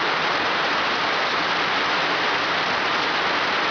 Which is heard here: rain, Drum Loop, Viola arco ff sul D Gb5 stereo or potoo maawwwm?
rain